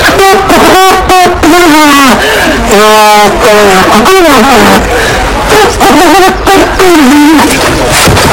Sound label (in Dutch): toeter